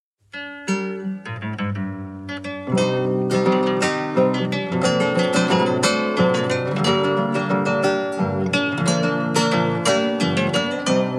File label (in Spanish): guitarra trasnochada